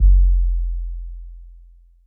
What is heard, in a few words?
kick, Heartbeats